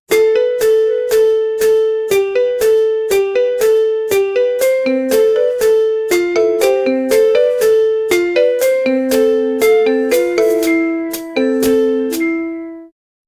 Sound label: music for kid puppet proj